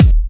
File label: Kicks; Vengeance Samples